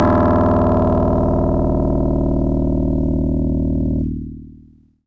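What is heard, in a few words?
Harpsichord DX100 D#2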